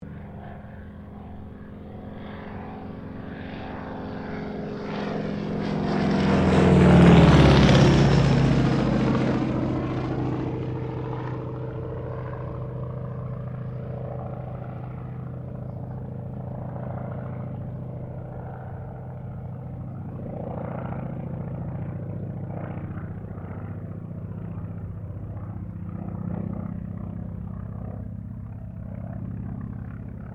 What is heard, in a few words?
AIRCRAFT CAC WIRRAWAY PASS; CA-16 Wirraway; Airplanes; Vehicles